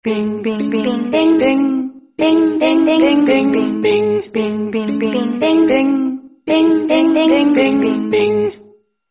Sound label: bing bing message ringtone; bollywood ringtones